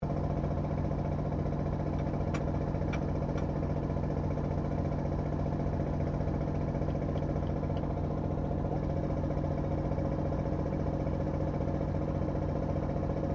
Train Diesel Shunter VA1 Class 1947 Tasmanian Idling Loop; Bellarine Tourist railway vehicles